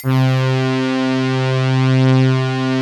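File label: metal sweep C3; METALSWP; Greytsounds; Scott Peers Sound Engineering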